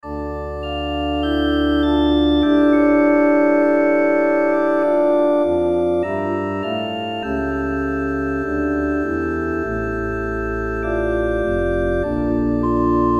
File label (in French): Tierce en Taille; Marchand